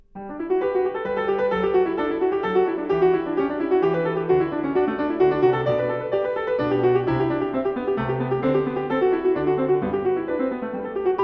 Goldberg Variations BWV 988 Variatio 5 a 1 ovvero 2 Clav.; KIMIKO ISHIZAKA